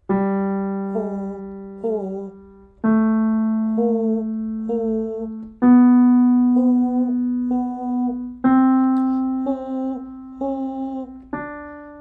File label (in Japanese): 裏声（脱力）